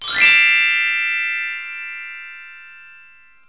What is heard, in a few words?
hi scale, bells; Multimedia Cube